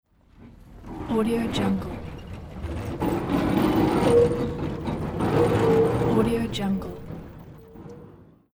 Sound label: old tram train passing by